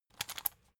salt mill metallic; gastronomie; soundpool

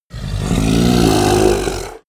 orc growling; kreaturen

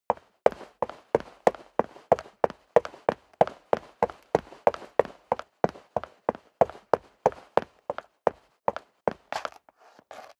step leather shoes concrete run sequence

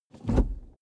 suv pull back gear across fast; verkehr transport; autos

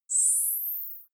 zoom out, signale

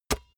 basketball single hand catch soft

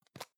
plastic can lid screw open soft; objekte; dose

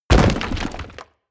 break stone small; atmosphaeren umwelt; erdbeben

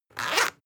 zipper open fast; objekte; reissverschluss

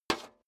step high heels metal dry slide short; schritte

